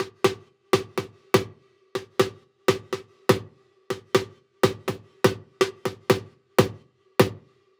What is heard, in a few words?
Synth Loop 123 Gm